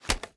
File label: reload empty fast end, weapons; xmag; gmod; viper